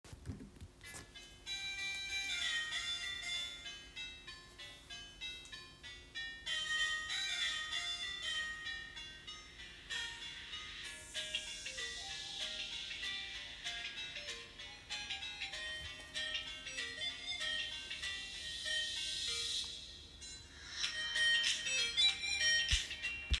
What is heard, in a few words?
Mini speaker recording; Cardboard Portable Speaker From Headphones